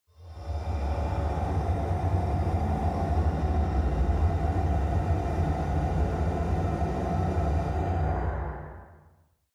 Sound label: mechanic ambience